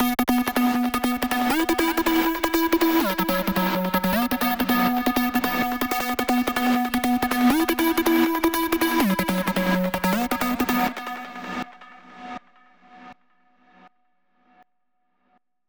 160 C maj Sequence Wet Tail

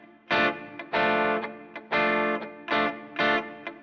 Guitar A 7

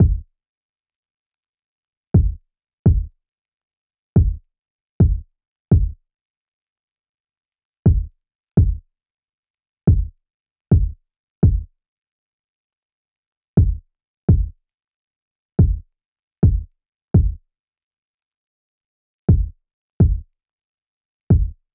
Kick Cm 84 BPM

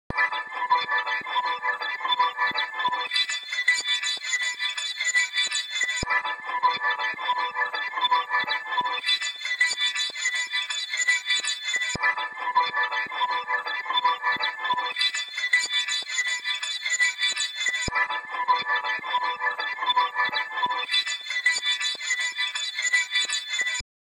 162 BPM A Min Pad

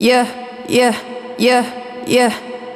174 vocal loop yeah repeated wet E min

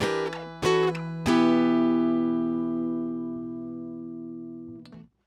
Em Guitar Hit

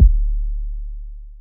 kick 808 clean

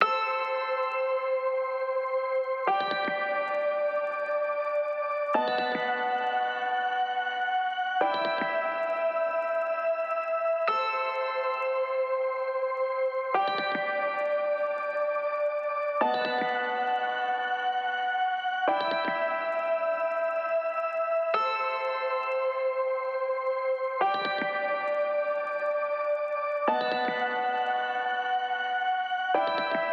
Keys Strings 90BPM Dirty Vintage

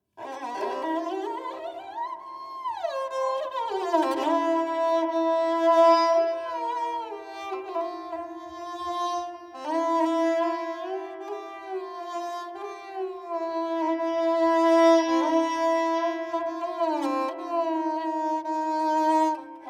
String Sarangi Free Time C Sarangi

